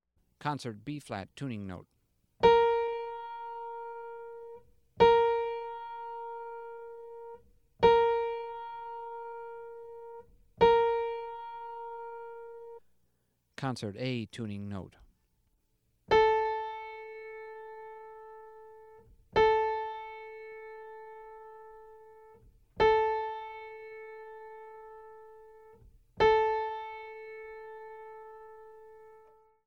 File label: Tuning Note; James „Jamey“ Aebersold Play-Alongs